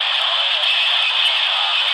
Multiple two-way radio communications with interference.; Two-Way Radio Telemetry, Radio Static